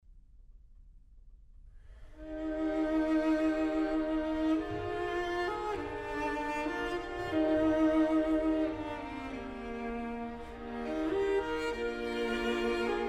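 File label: String Quartet No 22 in B Flat Larghetto; Wolfgang Amadeus Mozart; Mozart String Quartets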